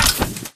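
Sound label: Tick Movement Footstep Jump Land; Titanfall 2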